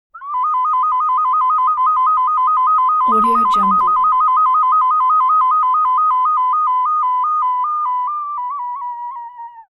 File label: musical elements ocarina trill